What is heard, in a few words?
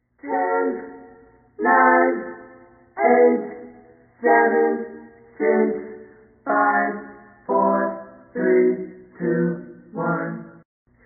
music happy birthday tik tok